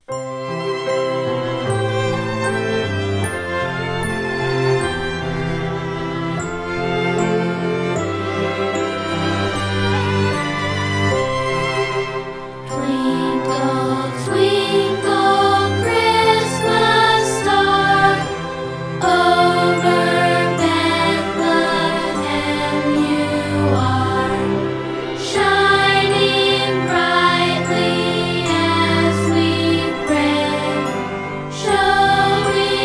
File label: Twinkle Twinkle Christmas Star; kids christmas program